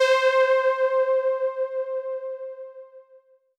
LEAD Bold (C); Melodic One Shots; Cymatics; GHOST (Beta Pack)

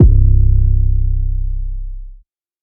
808 - C; Drum One Shots; Cymatics; Light Free Sample Pack; Bonus Samples

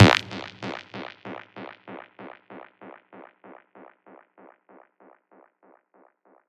Squelch F 144 BPM, Psytrance; Ghosthack; Psytrance Visions Volume 2

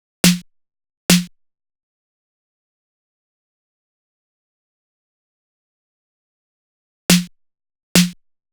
Drum Loop 140 BPM Snare; Footwork; Experimental Drum Loops; Cymatics; Mystery Pack Vol 4

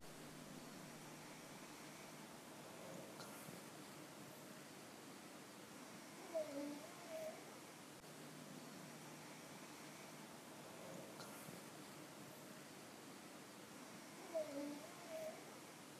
Drum Loop - 120 BPM Texture; Loop Stems; Cymatics; Vibes II Drum Loop Collection; Operation

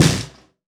FX Snare

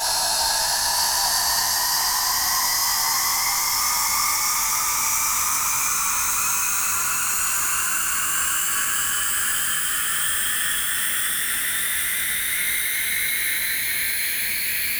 Hollow White Noise Up; House; FX

